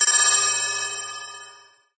amb random tech; source engine; portal2

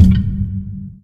lightbridge, footsteps

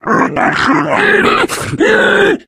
charger lurk, voice idle; source engine; left 4 dead 2

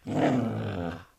dog med inside growl, animal; ambient; Source Engine; cstrike

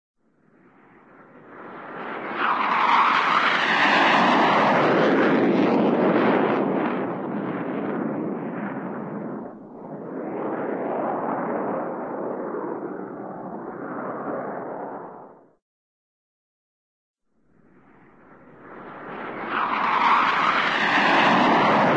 airplane, jet F-84 pass by; cartoon

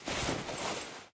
workout pushup; taunt; player; video games; source engine; tf

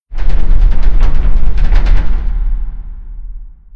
train rattle; video games; source engine; portal2

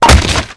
wood plank break; Source Engine; video game; physics

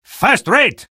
positive vocalization; spy

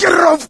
scream while pounced, coach; voice; source engine; left 4 dead 2; survivor

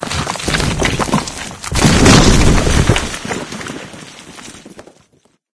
rockslide; video game; source engine; portal2; ambient; dog v strider

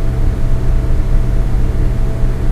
tone

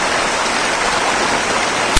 water flowing, ambience

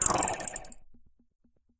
editor other collapse, ui; Source Engine; Portal 2

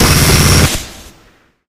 main shot; bison; tf

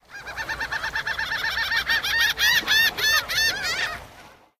random gulls; ambient; Left 4 Dead 2; Source Engine

